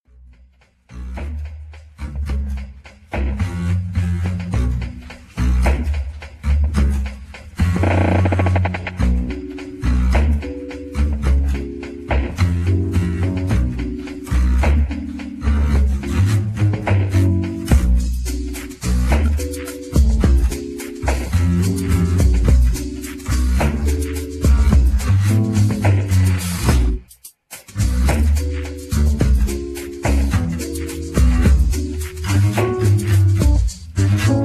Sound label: tango